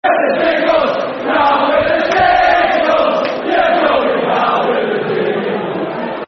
You're Going Down With The Dingles [Football Chant (Soccer Songs)]; Preston North End Pne